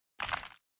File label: bite